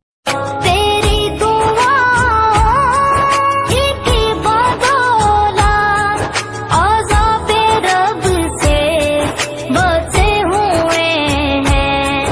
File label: Islamic ringtone; naat